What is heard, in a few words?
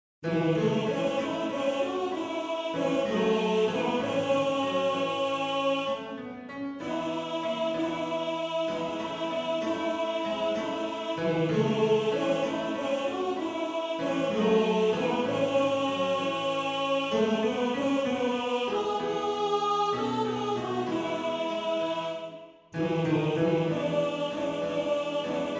Tenor; Tenor 1